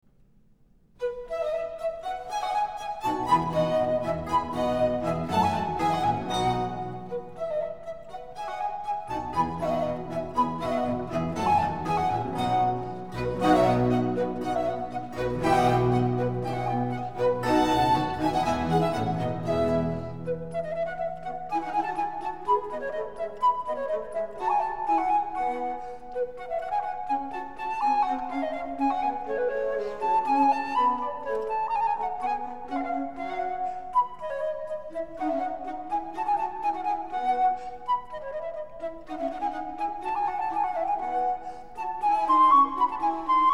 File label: Concerto For Flute And Strings In F, Allegro; Antonio Vivaldi; Flute Concertos, Oboe Concerto, Bassoon Concerto